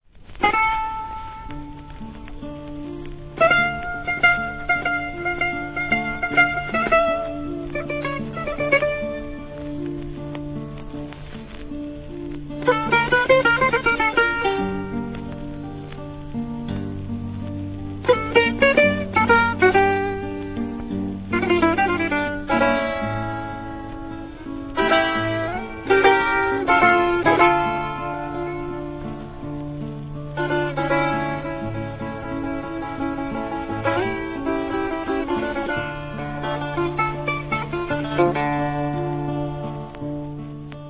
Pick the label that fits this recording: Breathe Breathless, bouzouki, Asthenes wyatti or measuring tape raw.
bouzouki